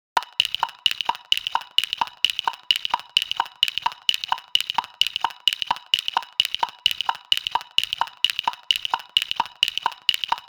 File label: loop wooden stone hits, 130 bpm